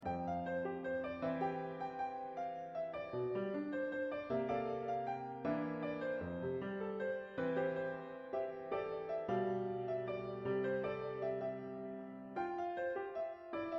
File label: Piano